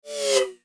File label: Bar chart fade out animation infographics